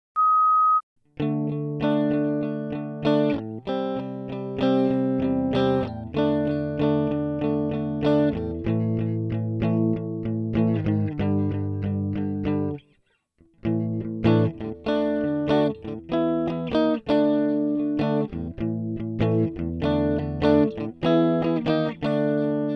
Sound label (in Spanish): Sonido sin amplificar sonido amplificado